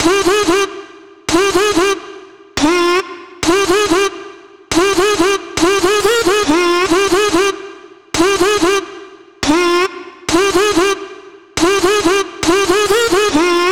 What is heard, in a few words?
Juke vocal chop, 140, Fm; Slate Digital Omnivox Vocal Sample Pack; Chops